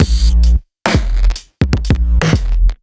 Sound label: Kit Wonk, 85bpm; off the grid